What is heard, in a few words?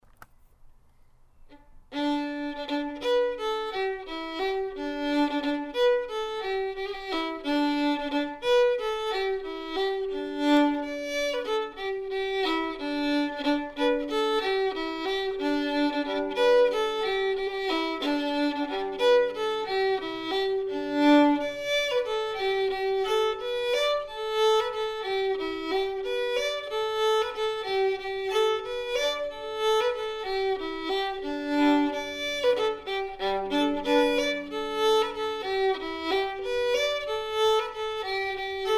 Polka, fiddle; Newmarket Polka